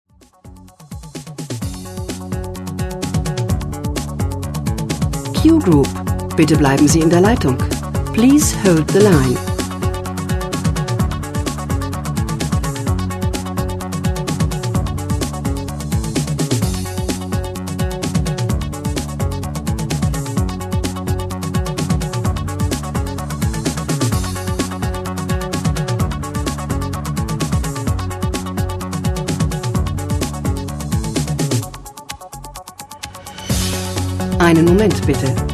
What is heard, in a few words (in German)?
warteschleife; q group